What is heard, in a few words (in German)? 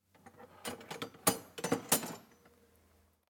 Maulschluessel weglegen